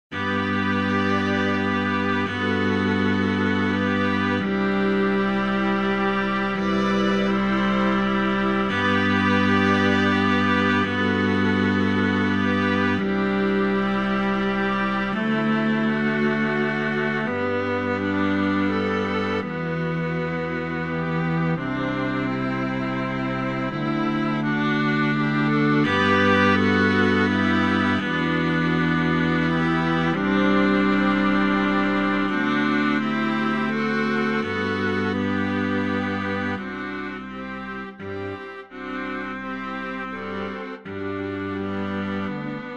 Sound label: gentle river orch